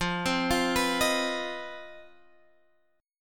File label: C Minor Major 7th Double Flat 5th F, chord